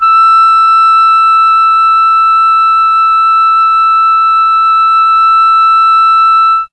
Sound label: E4 ALTO SAX, mellotron; CHMB ALTOSAX